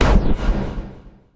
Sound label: Swooshy Reverbed Impact